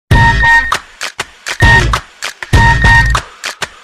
remix message nokia

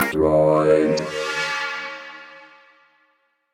motorola children

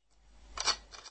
shot gun chambering

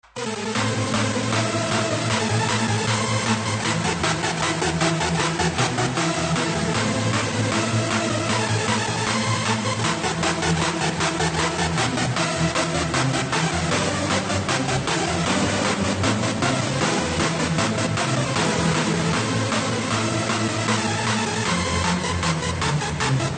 Mozart Turkish March

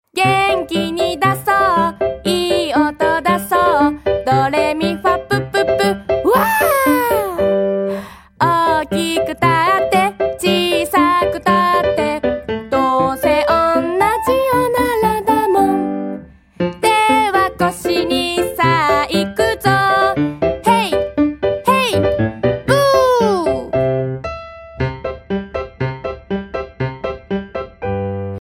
Fart Song

